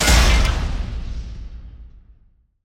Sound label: large metal impact; soundscrate